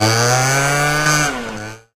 taunt moped wheelie engine rev; video games; source engine; tf

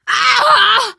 hurt critical, teen girl, survivor voice; source engine; left 4 dead 2

